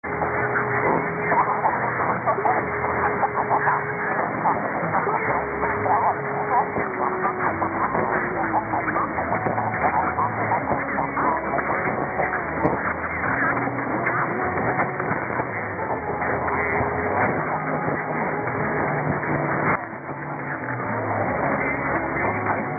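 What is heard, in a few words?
Asian music; Radio Asia UAE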